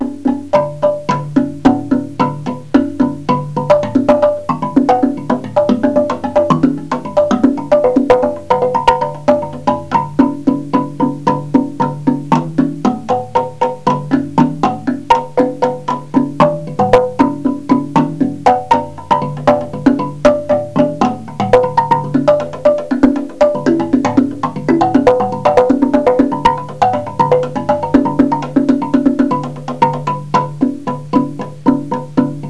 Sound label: music; drum1